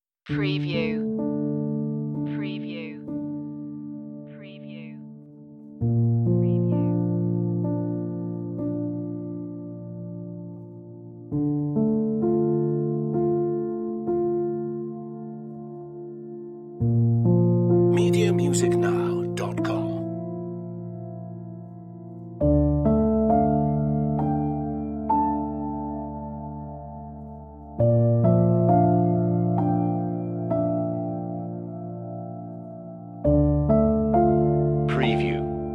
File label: piano; ethereal